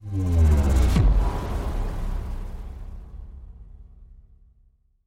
Bass Drop; SoundsCrate

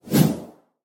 Text Message Woosh; SoundsCrate

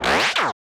stylized glitch sound; soundscrate

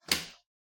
binder snap

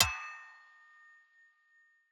Sword Clang; soundscrate

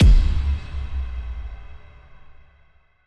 Epic Hit; soundscrate

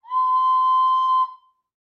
Slide Whistle Sustain; SoundsCrate

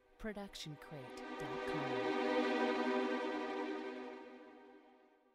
tremolo strings mysterious; soundscrate

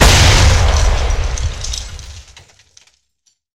explosion boom; soundscrate

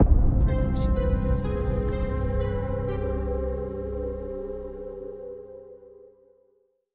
Mystery Ident; soundscrate

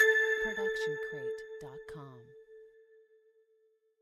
tonal blip; soundscrate

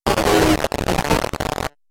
robotic glitch

